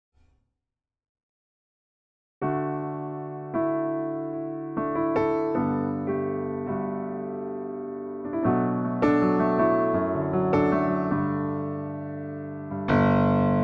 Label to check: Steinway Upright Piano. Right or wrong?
Right